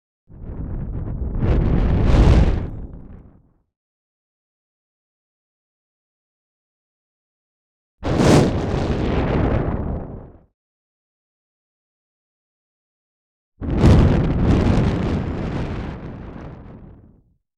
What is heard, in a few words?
fire fireball; Asian Show Sound Effects